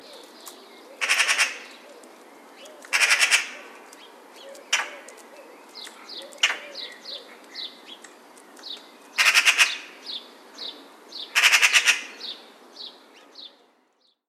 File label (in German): Elster Ruf